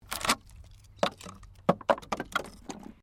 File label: Wood Movement Minor